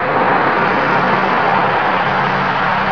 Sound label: pipe, air exchange; hl2